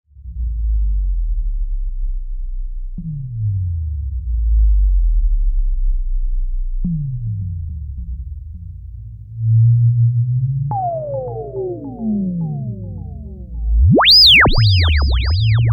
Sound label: Filter Euro